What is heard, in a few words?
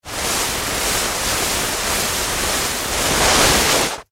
Sled Slide Down Hill, Sled Slide Down Hill Toboggan Tube Glide; Sledding; Human; Sports; Sledding (Sound Effects:Human); Blastwave